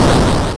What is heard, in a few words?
Explosion